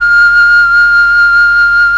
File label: f4, flute, Choir + Flute, MELLOTRON; Retro Sampler